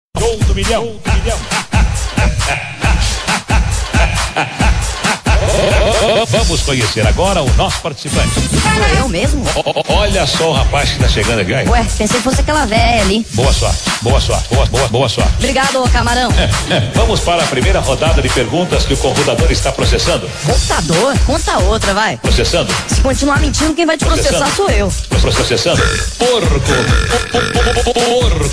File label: funk